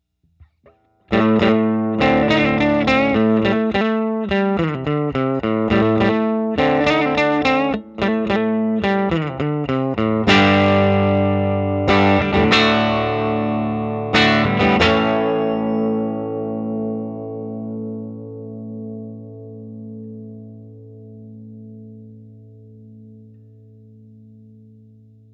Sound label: 6v6 clean